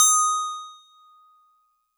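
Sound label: StarPiano DX100 D#0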